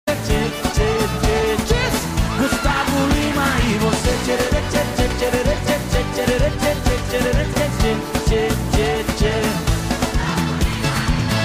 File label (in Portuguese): balada